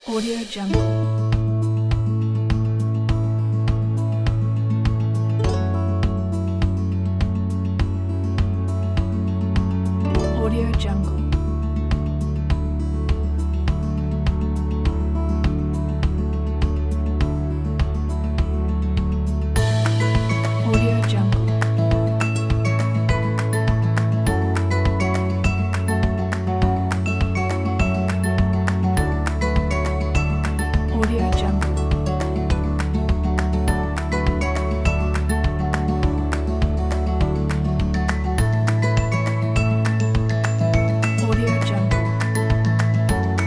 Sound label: acoustic upbeat